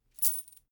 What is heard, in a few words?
chain mail armor movement